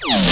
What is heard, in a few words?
croc down